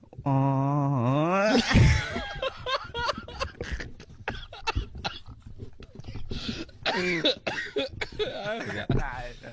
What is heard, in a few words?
singer laugh arab audition